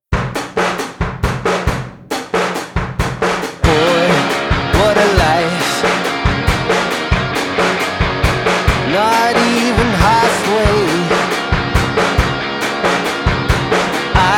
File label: Tambourine; indie